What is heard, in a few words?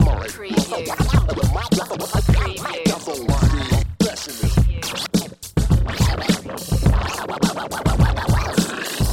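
Scratch Groove 105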